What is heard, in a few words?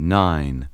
VOX GENERAL; Megabass Remix! 2